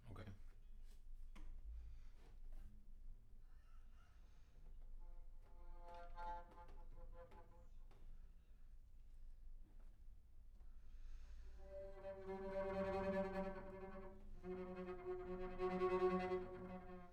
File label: Bass Room